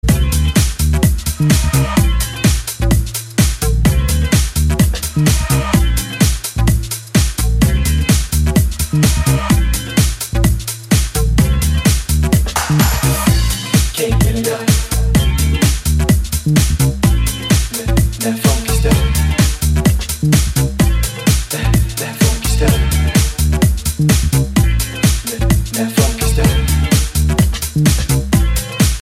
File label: rocking music tone ringtone